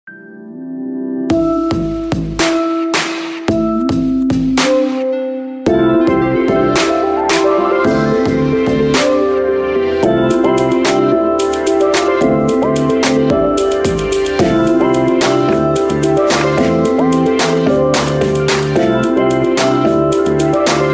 Ubuntu Ringtone

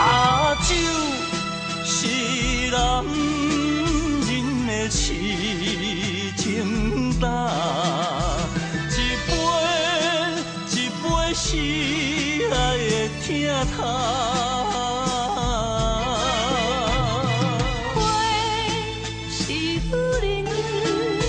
Chinese Song; meloboom

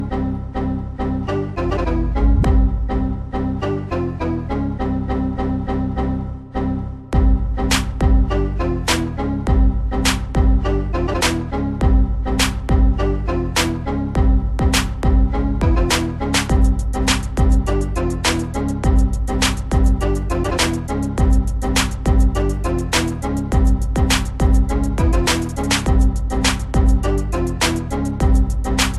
Windows Techno Mix

